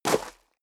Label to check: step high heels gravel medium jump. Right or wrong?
Right